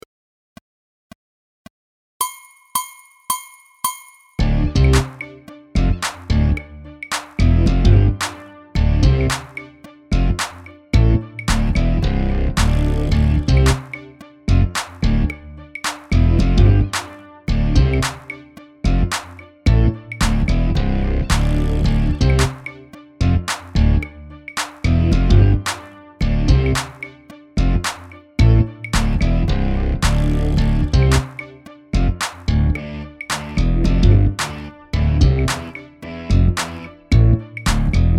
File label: jazzy groove loop